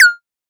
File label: Borg Perc, drum kit; Polyend; Stazma & Concrete Collage; Concrete-Dual Borg